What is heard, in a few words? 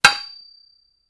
Metal Impacts Dull, pipes